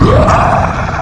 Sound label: Hound Jumpscare; backrooms 2d android ios online windows gamerip